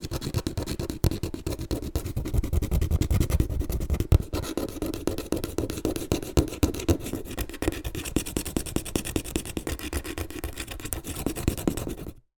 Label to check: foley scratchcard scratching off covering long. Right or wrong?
Right